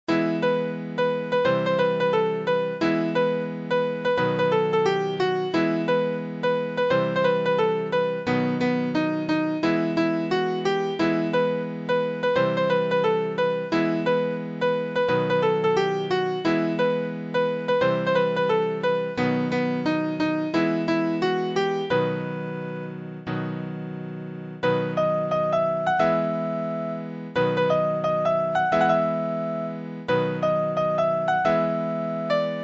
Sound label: Piano